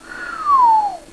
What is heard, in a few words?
whistle; Sound Effects for Multimedia - Volume 1; wind instruments